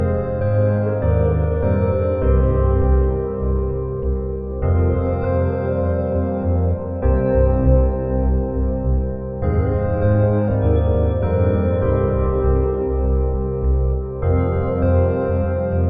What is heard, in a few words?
gameplay music; freepixel; Wordacted